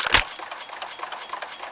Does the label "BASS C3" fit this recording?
No